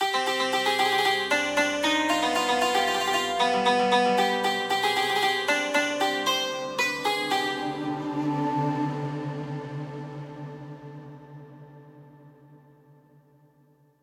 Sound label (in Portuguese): Deserto Intro